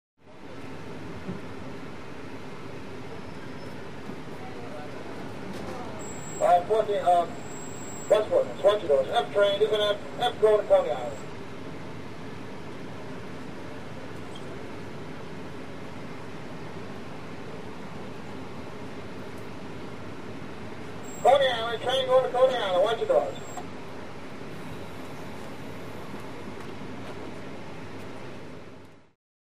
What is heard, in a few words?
Int Modern New Y Subwa, subway